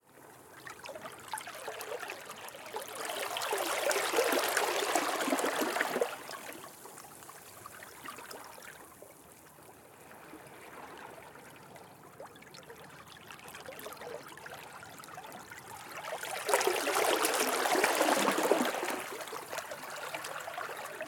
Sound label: Ocean small waves flowing between rocks very close perspective, Brittany; Stereo; Dramatic Cat; Ambisonic Waves - Vol.1